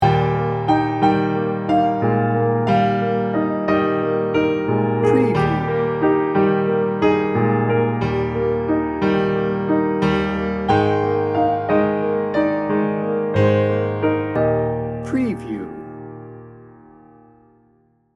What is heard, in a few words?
dreamy piano